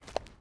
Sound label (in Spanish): Paso sandalia piedra